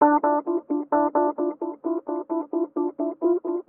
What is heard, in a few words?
Rhodes before; stereoplacer